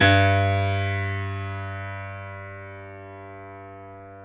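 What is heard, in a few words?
piano, Grand piano; Club-50 - Foundations Roland; YPno 1 S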